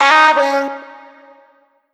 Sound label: Vocal one shot C#m; Slate Digital Omnivox Vocal Sample Pack